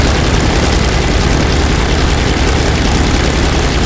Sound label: bearcat side, engines; War Thunder